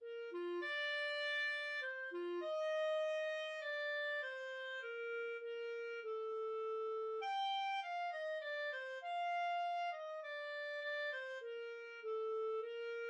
dona nobis pacem clarinet trio

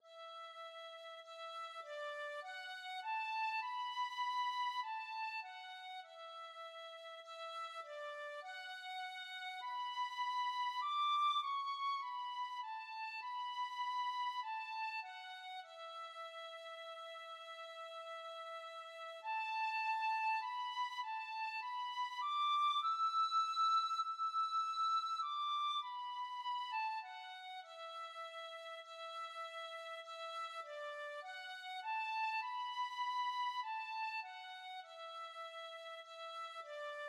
tin whistle

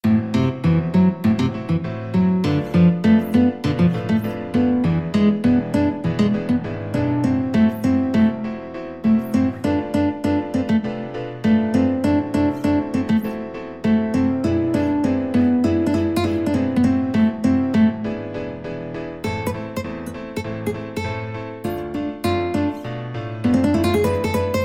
stomp, guitar

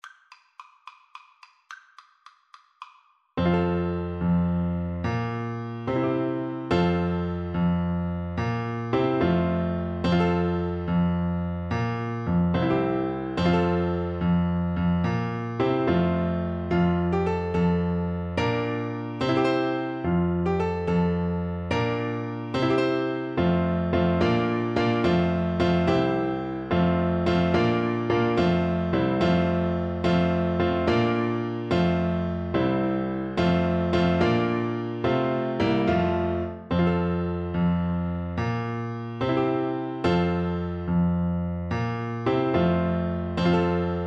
horn, French Horn

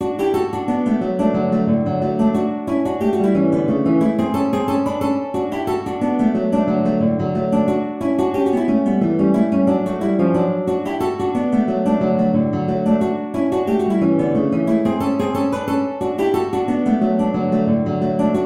Reel 2 GTRS

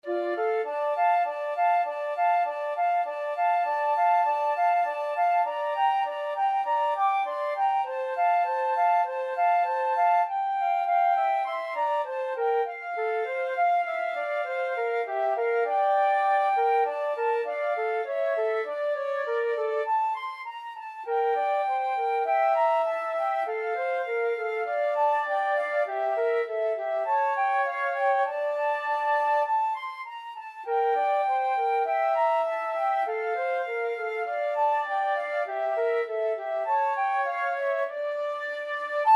ose shalom, flute duet

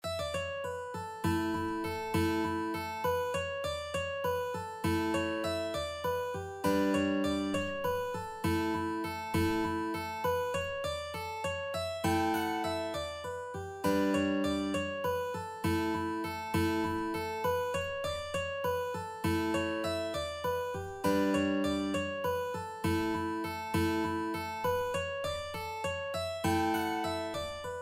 mooncoin, mandolin

